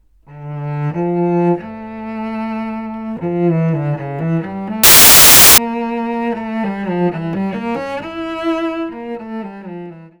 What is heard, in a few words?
cello